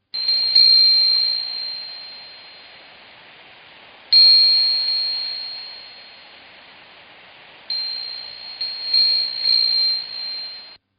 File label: Wind Chimes; Musical; Partners In Rhyme